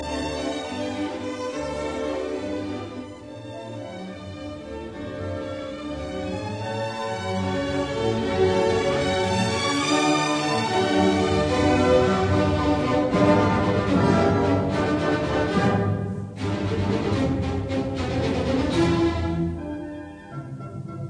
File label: Symphony No 9 in C major Scherzo Allegro vivace Trio; Schubert